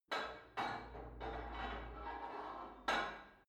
put weight onto barbell unsteady reverby; sport freizeit; fitnessstudio

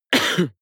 cough male single attenuated soft; koerpergeraeusche

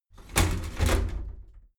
shower slide door inside close regular halfway

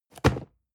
cardboard box medium fall low hard; objekte

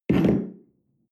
espresso place tablecloth slide wobble

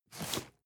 cardboard box medium slide short hard; objekte

